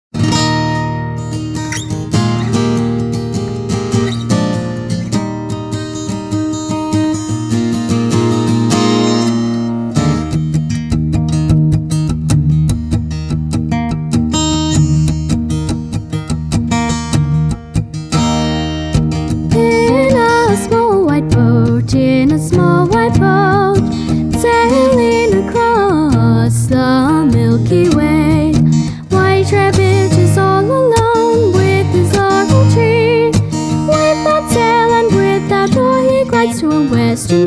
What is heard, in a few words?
- (English)